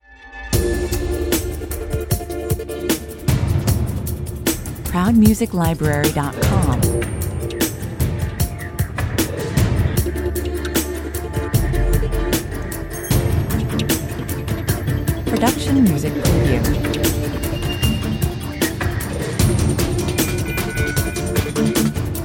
train in dark tunnel 153 bpm

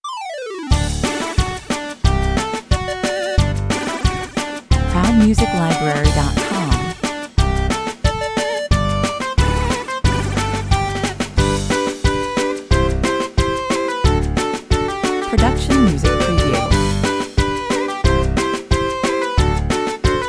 retro video game no sound fx

